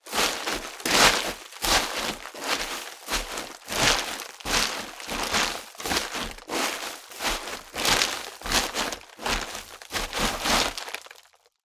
Footsteps Male - Barefoot on Plastic Carrier Bags

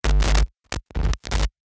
Guitar Computer Jack Noise

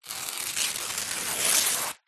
household baking grease proof paper rip

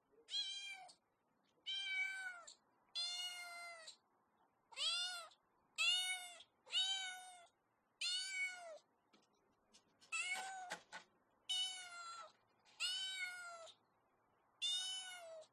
barn kitten crying scratching meow

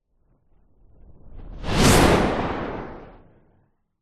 fantasy magic whoosh dark reversed powerful

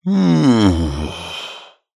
fantasy giant male sigh in pleasure; zapsplat

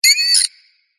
squeaky metal Designed Alien Bird; PMSFX

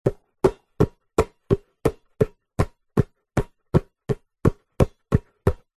basketball juggle between hands fast; sport

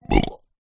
human male 37 years old burp

